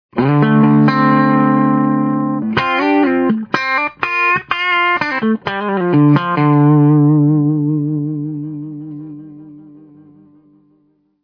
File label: compressor, effects